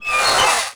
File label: armour roof peel